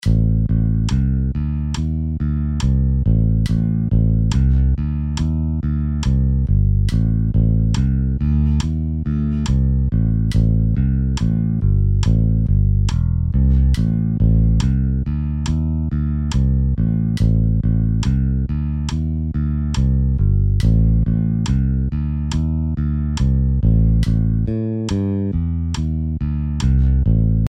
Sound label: Bass Tabs Exercise